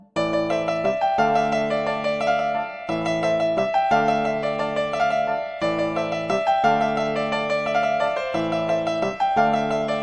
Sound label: Punjabi Ringtone